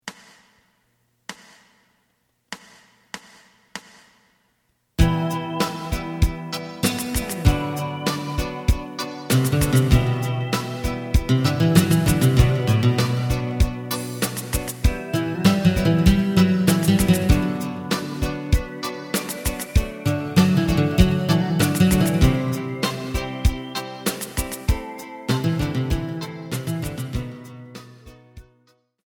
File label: bass